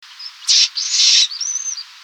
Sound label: indiv 2 note screech call, vswift